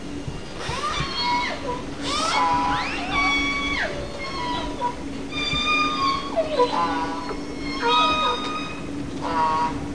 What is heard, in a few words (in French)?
Pod d orques residentes du groupe sud